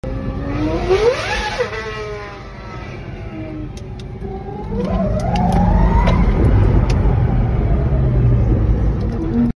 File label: lotus evija, اگزوز; لوتوس اوایا